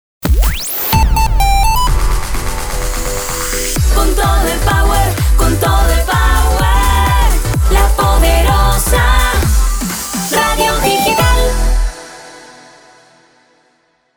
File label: Jingle; La Poderosa; Sub Mix